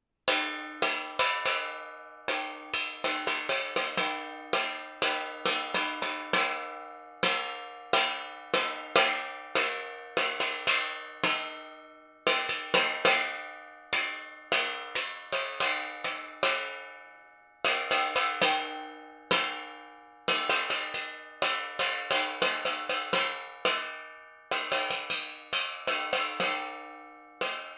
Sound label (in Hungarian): femtalca fakanal nyelevel valo utogetes hatulrol; perkupa; hangkeltes 2015